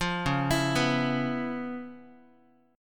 Db 7th Sharp 9th Db down Guitar; Db7#9 arpeggiated; JGuitar

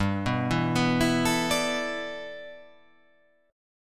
G 6th Add 9th

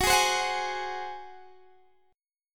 F sharp Suspended 2nd Flat 5th, Guitar, Open D

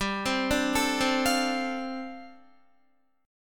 G 9th Suspended 4th, Guitar; Listen to G9sus4 arpeggiated; G9sus4 Chord

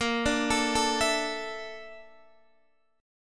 A sharp Major Sharp 11th, Guitar, Open D

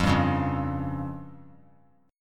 F Suspended 2nd Sharp 5th F down; Fsus2#5 strummed; JGuitar

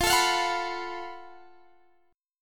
Gb Minor Major 11th F

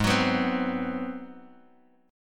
G sharp 7th Sharp 9th, Bass Standard; strummed; 16,21,21,17; JGuitar

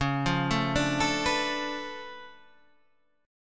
D Minor Major 13th, C sharp

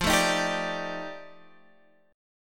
F 13th, Guitar Standard; strummed; chord

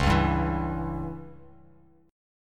C sharp Diminished C sharp, Bass Standard; C#dim strummed; JGuitar

